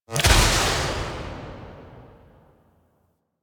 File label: Impact; Warframe; Tenno; Grimoire